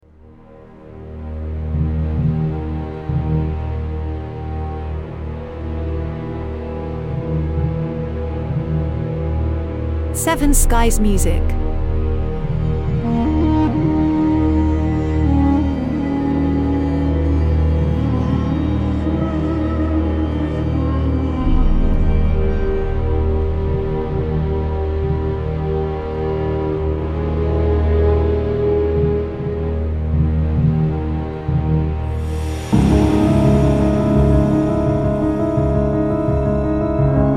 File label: Big Hollywood Dramatic Score